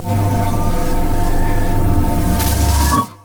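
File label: terror capacitor; LoL; battlecasturgot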